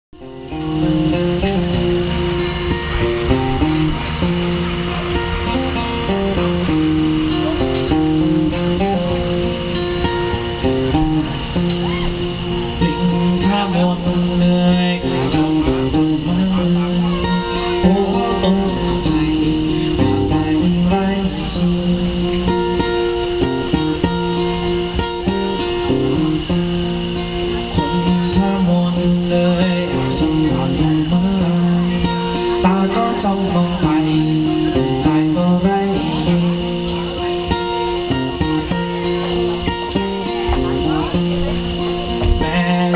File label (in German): saenger, Barsaenger in Arany Prathet traditionell 2; thai cambodia